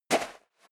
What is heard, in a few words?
step leather shoes sand single left